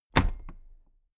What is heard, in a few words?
toolbox place upright heavy